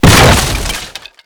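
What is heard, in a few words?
wood crate break medium; Explosions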